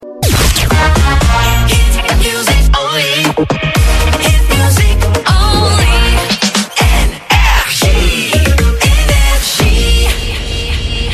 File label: Jingles; NRJ Shotgun ID (2025)